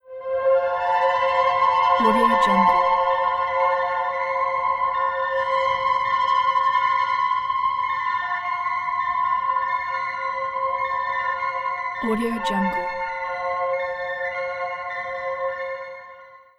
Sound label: magic pad